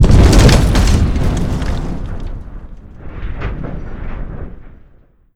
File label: explosion sub hit shake